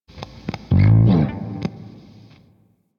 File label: Sentient Shawzin Un Equip